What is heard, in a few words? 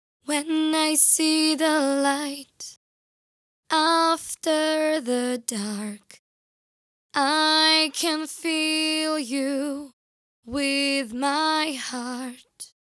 VOCAL DRY